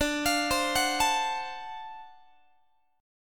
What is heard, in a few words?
D 7th Sharp 9th, Guitar; arpeggiated; x,22,20,22,22,20; 4A,4E,5A,5D,5G#,6C#; chord